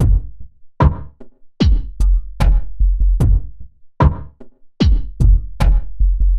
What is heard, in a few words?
Beat, Drums & Percussion; Electrocution; Beats